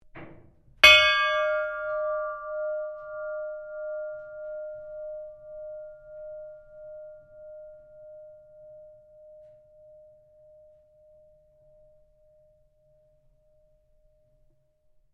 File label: SHIPS BELL IRON RING; Flinders; Various ships bells; Boats; Vehicles